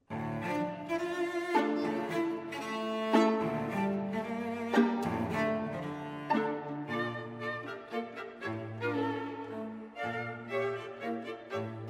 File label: Mazurka; Tsaikovski; kammer